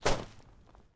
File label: footsteps gravel